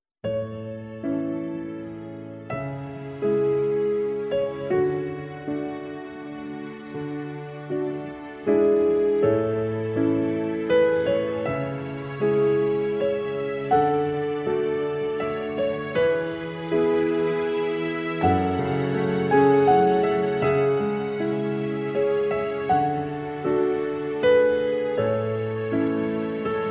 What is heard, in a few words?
bgm elf theme